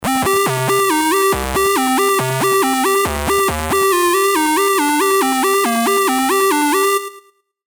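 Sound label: Sylenth1 lead, chiptune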